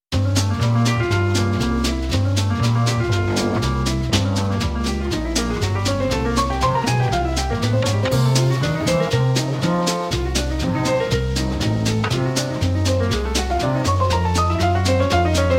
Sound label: In F Minor, Jazz Ensemble